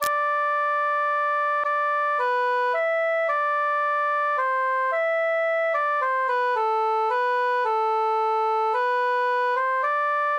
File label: cantus solo; jubilate deo